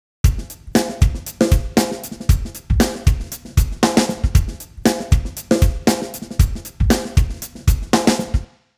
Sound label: drums, percussive, time stretch; apple logic pro